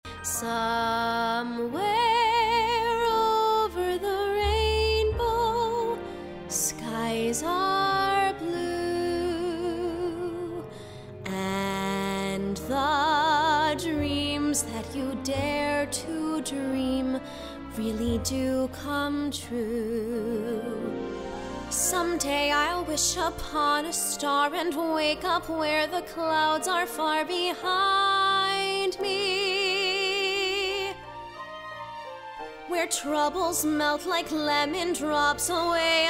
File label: vocals; amp regional arts and media program